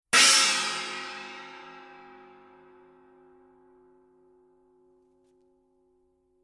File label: Color Sound 900 Black China Paiste